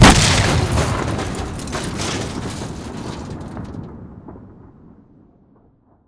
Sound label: Explo Close; AI Reaper; Titanfall 2